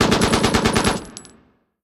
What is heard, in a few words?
infantry fire mg standing; Hearts of Iron IV Death or Dishonor